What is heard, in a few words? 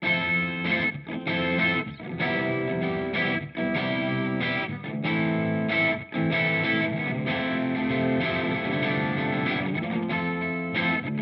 Boss GT 1000 Core Amp Diamond Crunch